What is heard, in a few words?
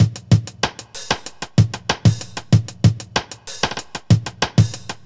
kit; Sound Effect Library